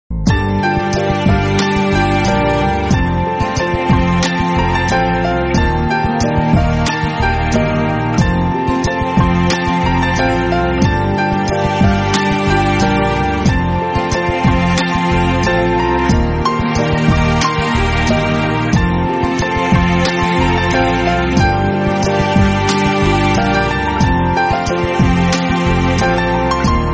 Instrumental

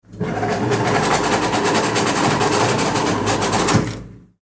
money counting

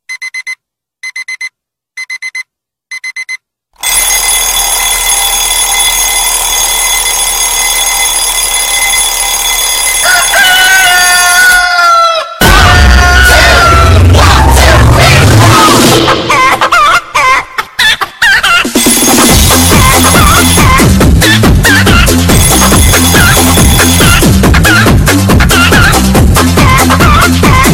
nokia 1202, alarm dj remix

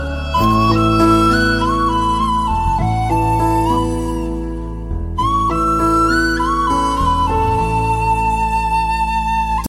flute sms tune

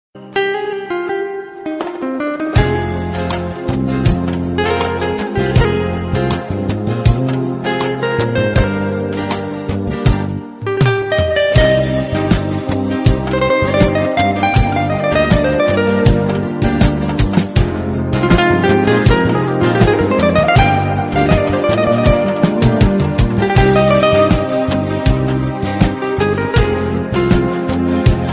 Persian guitar, Persian guitar music

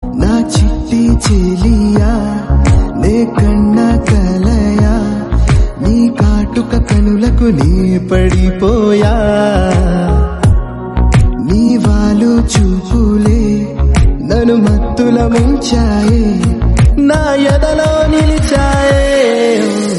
Telugu Song

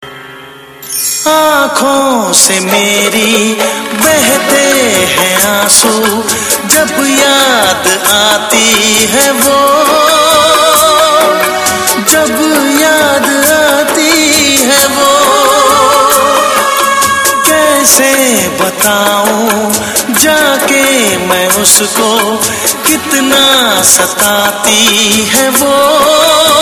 ringtone

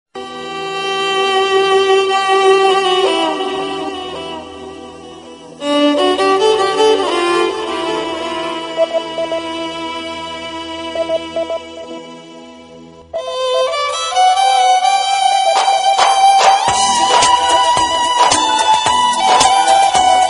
violin, indian classical violin

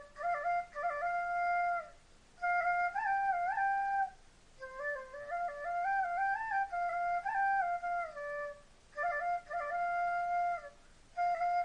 Flute, melody

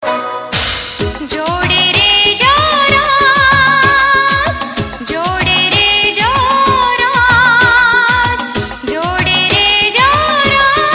dandiya masti, gujarati

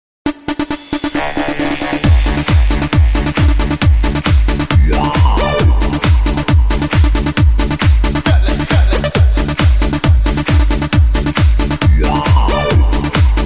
alert tones

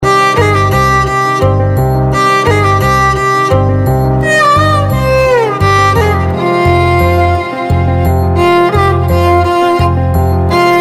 violin instrumental, violin instrumental indian; pisasu violin bgm

